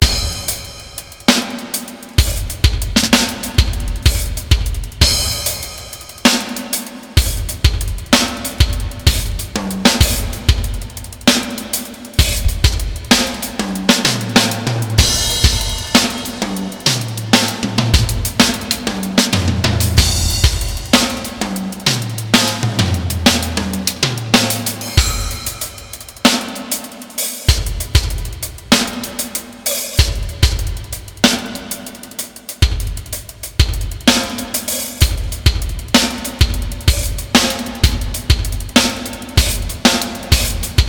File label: verb test